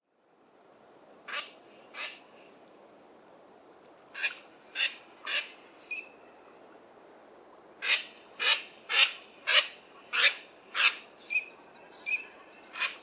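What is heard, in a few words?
common teal anas crecca; utok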